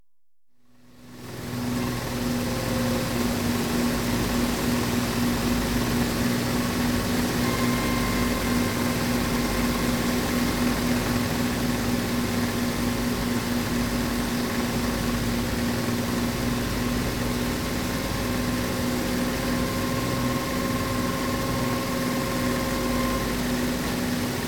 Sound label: DESCEND, LAND, Aircraft; The BBC Sound Effects Library